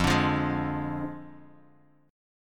F Minor 6th, Guitar, Open D

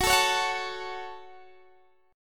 F sharp Minor, Guitar Open D; chordshape; down

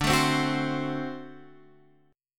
D 7th, down, Guitar, Standard; D7 strummed; JGuitar

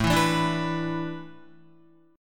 A Suspended 2nd Suspended 4th, Guitar; Asus2sus4; strummed

